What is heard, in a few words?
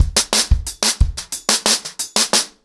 ACOUSTIC DRUMS, 90 BPM; The Roots Drumloops; Locked Out